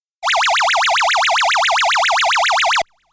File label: ufo